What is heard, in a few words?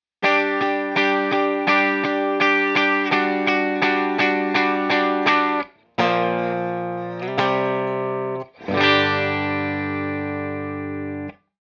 xotic bridge